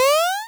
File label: jump